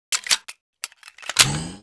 wpn med reload; republic

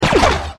fire turret; gmod; lfs luna s flight school

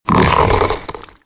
chew; valkyrie npc pack; fallenlogic; rancor

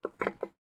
tap, rocket; gmod; arccw uc commons attachments

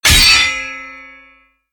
impact heavy; tridroidznnpc

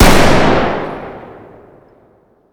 fire dist int shotgun; gmod; arccw uc commons attachments

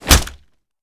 punch gun player; gmod; arccw uc commons attachments

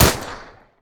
fire; gmod; arccw uc commons attachments

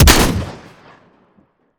shotgun lesslethal; gmod; arccw uc commons attachments

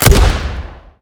fire, 40mm; gmod; arccw uc commons attachments

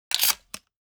shotgun insert; gmod; arccw uc commons attachments

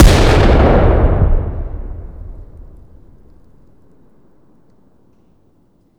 explosion close; gmod; arccw uc commons attachments

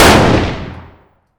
fire dist 308 rif int; gmod; arccw uc commons attachments

